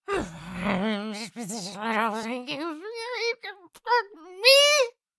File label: Cartoon little monster voice grunt growl